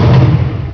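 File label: ENGINE STOP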